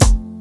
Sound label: SNAP, Synth FX Misc